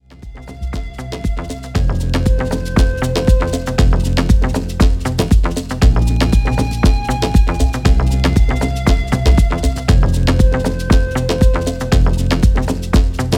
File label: instrumental mix